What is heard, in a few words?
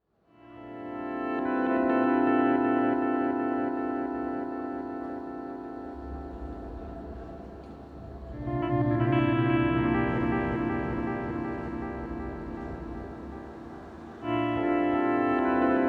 comfortable noise